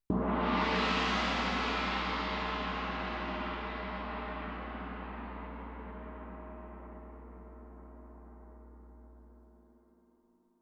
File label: Tam-Tam Close